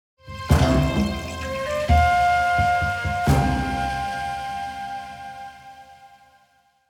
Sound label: Stinger; Zentimo